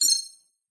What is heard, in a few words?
science fiction beep bright feedback positive command